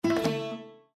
Windows Hardware Remove; Windows 7 Sounds; Raga

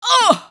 pain, female; Half-Life 2

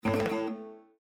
Windows Hardware Fail; Windows 7 Sounds; Raga

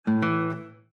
Windows Hardware Insert; Windows 7 Sounds; Heritage

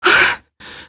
gasp; Half-Life 2; alyx

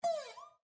Windows Pop-up Blocked; Windows 7 Sounds; Delta

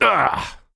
oww; Postal Dude Voice Lines

